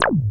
wasp sting; Masterbits; Vintage Voltage; SEQ-FX

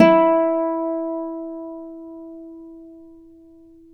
Celtic Harp, Plucked Strings

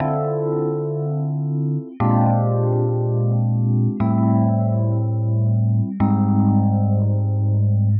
120 Rhodes Chords D, Keys, Nu Jazz House; PowerFX

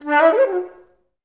FL FX, Advanced Orchestra; Best Service

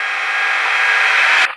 FX Loop, Drums & Drones

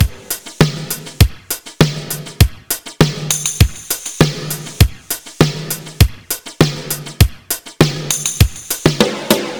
100 Beat; Skyfire; Zero-G - Future Wave

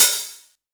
Hi-Hat Open TR-909 Unprocessed; PUNK VS WOLF

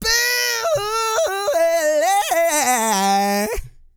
SING, Vocal Planet R&B & Dance; ILIO

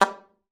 SLPB G3, ALTOSAX; Xsample VOL-2

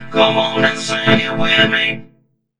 Funky Vocals; USB Soundscan vol.18; AKAI

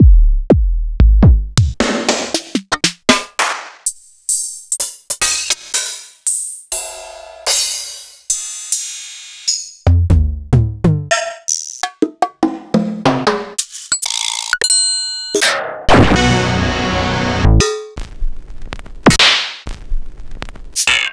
Kit; proteusvx

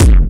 PULSE TECH, TECHNO RAVE; Pro-Rec - Synthsonic

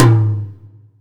FLAT, SR-16 DRUMS; EdgeSounds

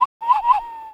WildFX, African; Quazibeat; African Secret; SFX

